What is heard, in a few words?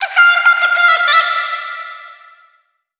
phrase C, Vocal; Slate Digital Omnivox Vocal Sample Pack